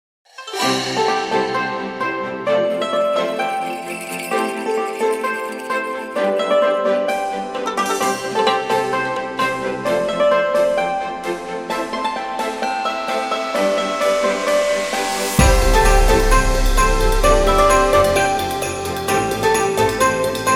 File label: Chinese New Year